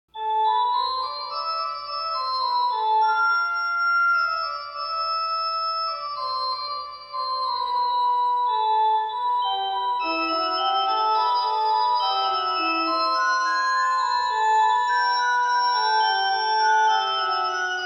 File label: Fugue in A Minor; Bach; Little Prelude and Fugue in A Minor BWV 559